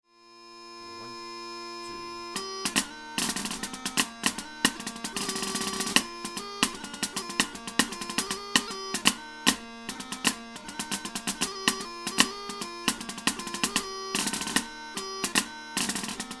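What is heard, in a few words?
Royal Scots Polka Intermediate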